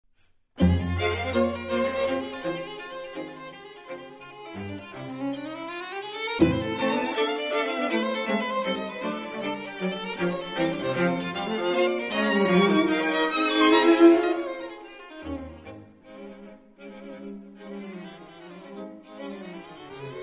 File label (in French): Quatuor